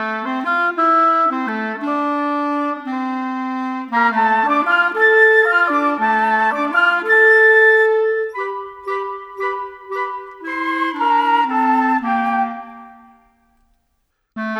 Clarinet, Flute Oboe, Orchestral Series Rock and Pop; Sonic Foundry (Sony Creative Software); Orchestral Series VOL-4 - Rock and Pop